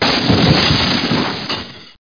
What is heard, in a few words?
glass break; heretic ii demo